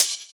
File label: Open Hhat, Hihat; Equipped Music; Loungin House